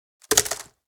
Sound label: Wicker basket set down